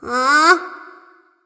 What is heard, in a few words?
nv confused; cupid